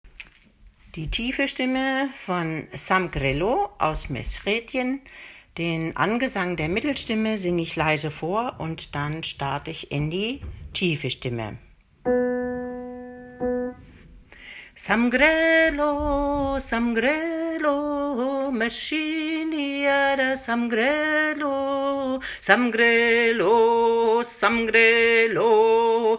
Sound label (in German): untere Stimme; Samq relo